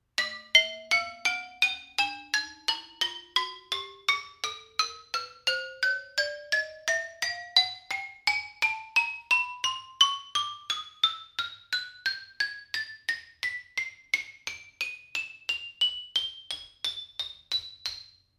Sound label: lithophone red